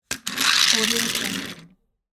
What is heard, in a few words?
rolling plastic toy car